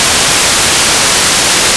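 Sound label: WAVE NOISE; AKAI S6000 CD-ROM - Volume 1; SAMPLE WAVE MIXING 1; 128 PRO SYN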